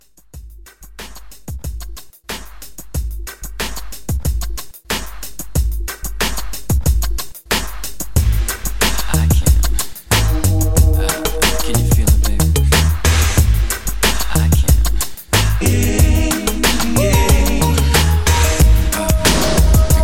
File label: 80s RnB Re-Drum 92